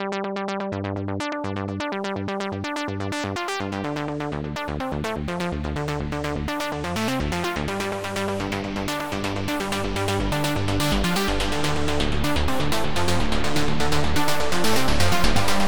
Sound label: trance morph